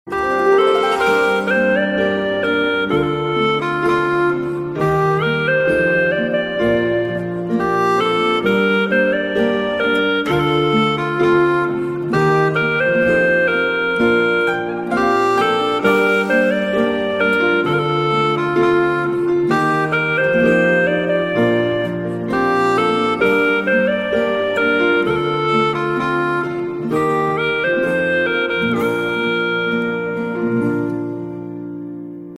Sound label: flute